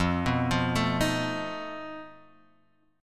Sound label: F 7th Flat 5th F Guitar Half Step Down